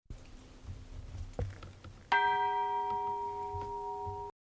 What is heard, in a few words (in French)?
cloche; St Paul de Salers, cloche 3